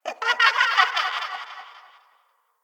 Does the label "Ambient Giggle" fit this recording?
Yes